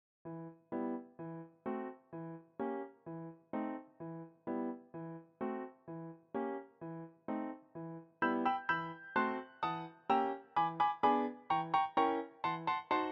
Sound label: piano; Sugar Plum Fairy arranged for piano